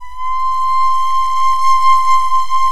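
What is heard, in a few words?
Japanese FLUTE C6; Soundscan vol.60; Asian Traditions; AKAI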